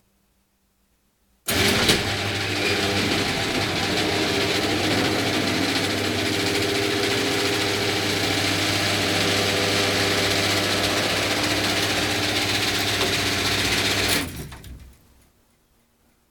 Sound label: Electric garage door opens